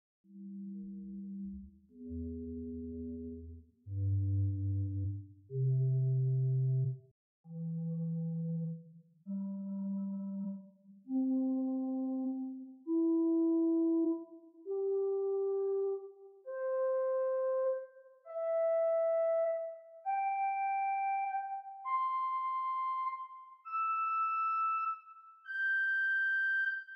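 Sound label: Great Hohlflute arpeggio, organ; Prudhoe Methodist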